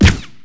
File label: tmp shoot